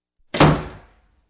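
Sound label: slammed irritably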